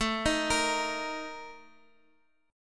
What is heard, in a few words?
Bb Major 7th Suspended 4th